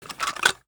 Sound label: wpn gbl plr inspect settle; viper